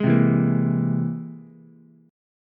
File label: C Augmented 7th, Ukulele; strummed; chord; JGuitar